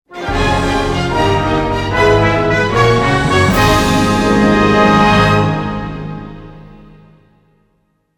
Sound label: Stage Clear; Sonic Unleashed